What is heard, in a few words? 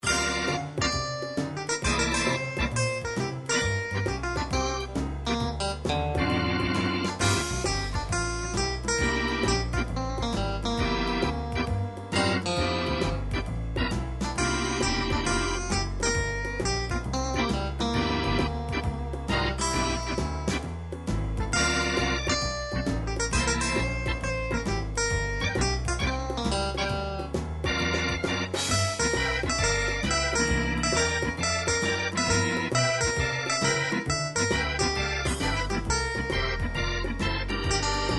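jazz guitar blues, midi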